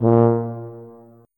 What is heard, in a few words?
tuba note